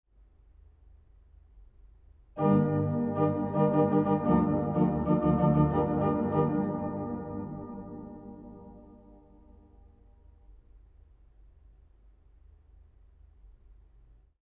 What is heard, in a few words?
24bit blower noise